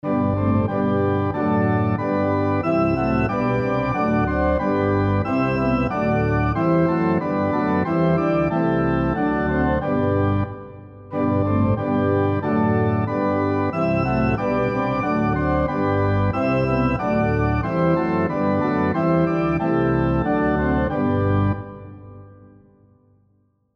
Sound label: SATB on Piano Example